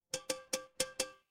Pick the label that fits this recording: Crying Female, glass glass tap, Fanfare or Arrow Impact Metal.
glass glass tap